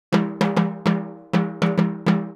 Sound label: Timbali, Latin Percussion